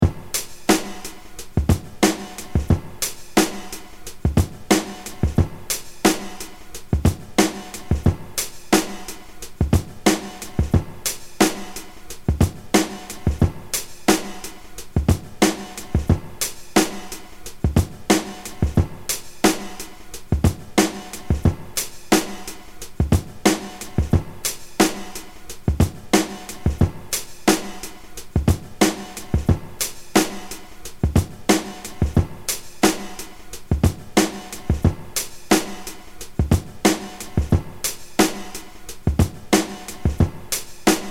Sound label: 89bpm